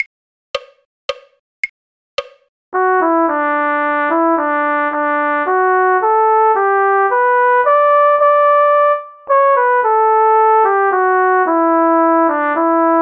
believe me if all those endearing young charms cornet; folk